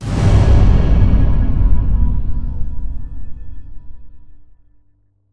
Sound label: Music Stingers Interface End Combat Stinger; Hunted: The Demon's Forge; x360